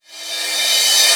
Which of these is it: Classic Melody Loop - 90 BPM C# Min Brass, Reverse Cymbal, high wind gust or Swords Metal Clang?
Reverse Cymbal